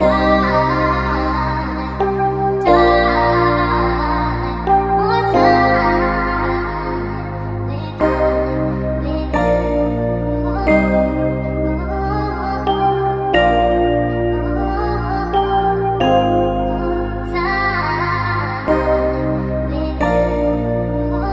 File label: keys and voice; loops; Phonky